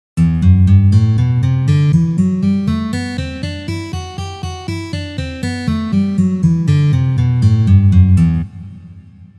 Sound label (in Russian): Gamma Mi minor naturalnyj vid pozitsiya